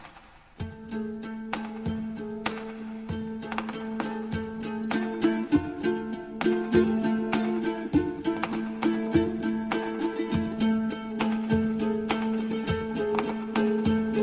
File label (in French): RUMELAJ - Bulgarie; Rumalaj